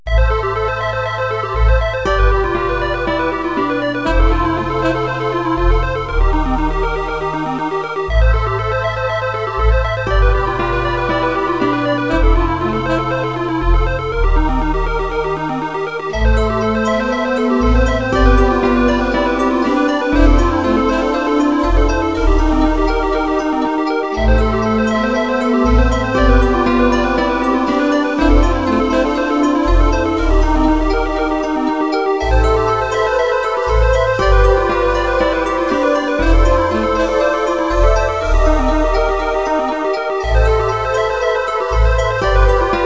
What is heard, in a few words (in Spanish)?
acorde